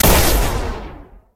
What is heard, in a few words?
nex fire, weapons